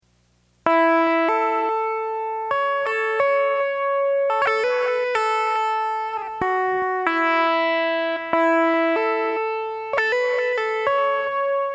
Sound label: Bagpipes